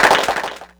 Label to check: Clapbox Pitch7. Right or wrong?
Right